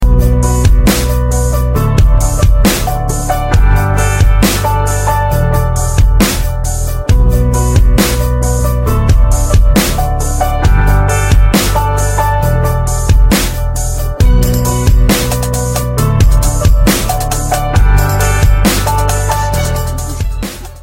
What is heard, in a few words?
hard love beats; hip hop